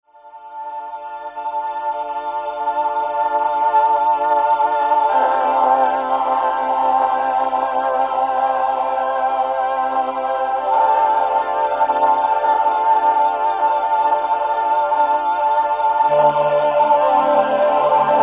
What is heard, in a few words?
VP-330 choir